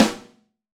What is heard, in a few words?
St Snare; Roland BK-5; Snares